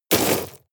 shovel dig impact hard

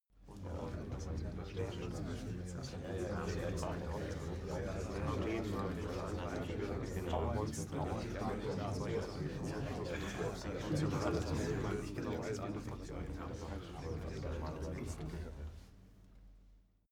male crowd mumbling

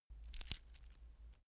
metal watchstrap click

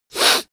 sniff female

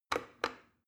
plastic button start click regular slow

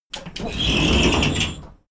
train intercity train hydraulic slide door open

